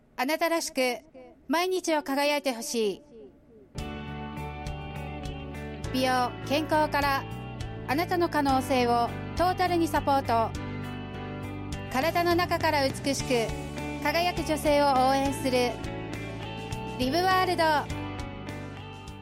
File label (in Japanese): 20秒CM BGM